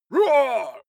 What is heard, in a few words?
male fight moan; schreie; menschen; soundpool